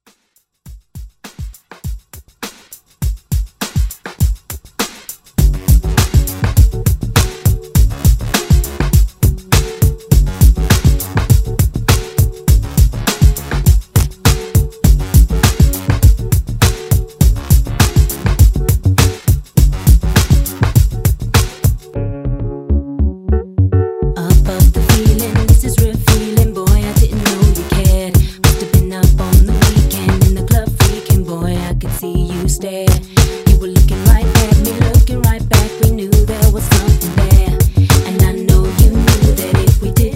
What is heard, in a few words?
throwback redrum